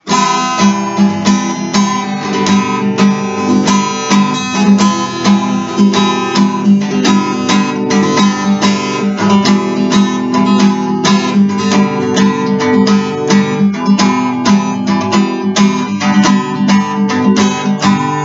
Гитары бой

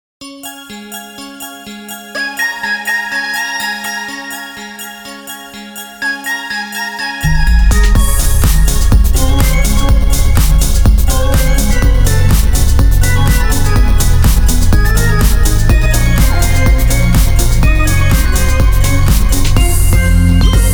Основная мелодия

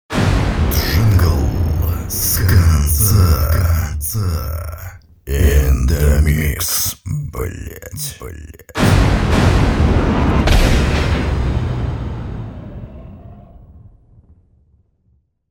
Джингл

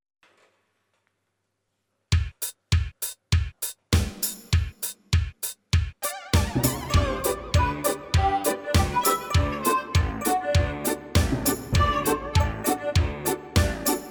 Смешная музыка

